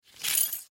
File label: Belt Leather Grab, Belt Leather Grab Scrape; Foley; Scrapes; Sound Effects:Objects; SoundEffects-Blastwave